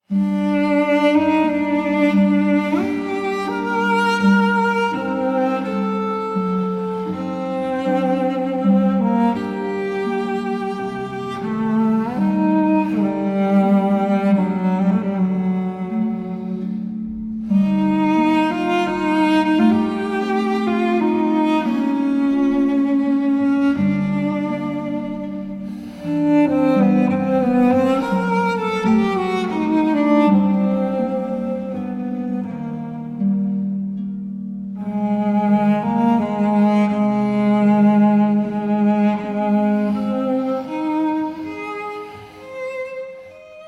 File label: Piano Concerto No. 23; Mozart